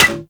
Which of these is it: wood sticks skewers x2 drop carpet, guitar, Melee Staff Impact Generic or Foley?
Melee Staff Impact Generic